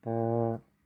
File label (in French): trompette buzz